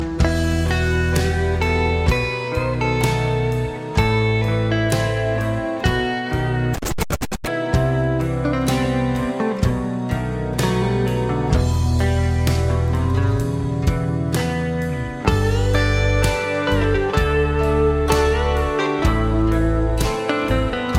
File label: guitar lead, rock; ringtones